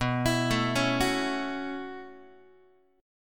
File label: B 9th Suspended 4th, down, Guitar; B9sus4; arpeggiated; JGuitar